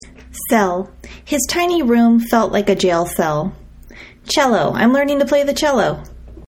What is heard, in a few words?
cello low quality